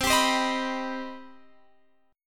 C Minor Major 7th C Guitar Open F; CmM7; strummed

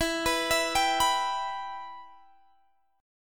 E Minor E, Guitar, Half Step Down

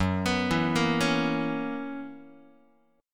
F sharp 11th F sharp Guitar; arpeggiated; JGuitar

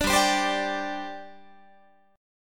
G Suspended 4th G down Guitar; Gsus4 strummed

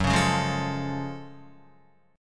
F sharp Minor Major 9th F sharp Guitar; strummed

